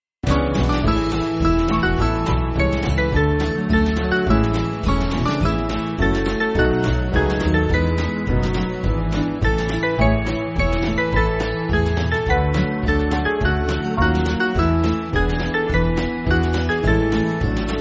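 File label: Band, Backing Files for Churches; To The Regions Beyond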